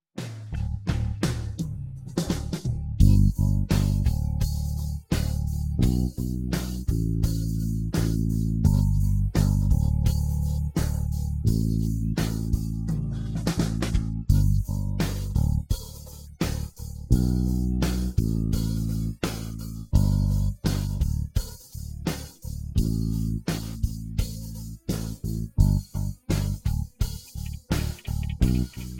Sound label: pop country; backing tracks; pop country solo 1